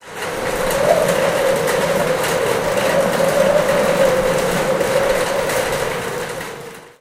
Sleet with Wind

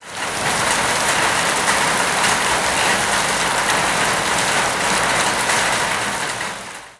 Rain Moderate with Sleet

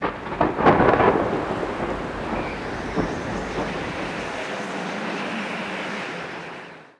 Thunder with Wind

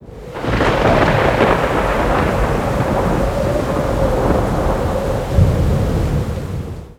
Rain Moderate with Thunder and Wind

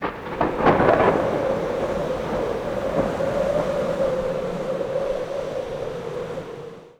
Thunder with Strong Wind

